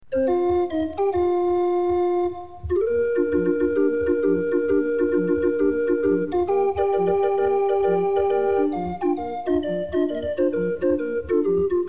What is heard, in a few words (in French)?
orgue; turlutain